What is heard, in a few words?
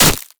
monkeyking basic attack; LoL